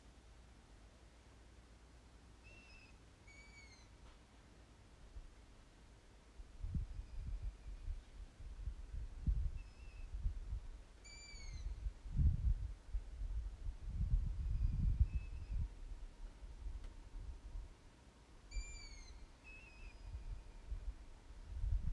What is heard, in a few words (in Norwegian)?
fuglelyd